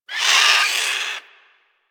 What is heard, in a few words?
Avichaea Vocal Death; Warframe; Hunting Critters